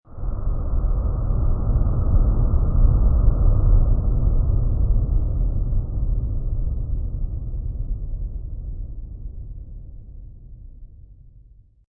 Rumble Fly By, Processed air by, jet, or distant underground flame burst; pass by; Airplane Sound Effects